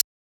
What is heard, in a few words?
User Interface Click Button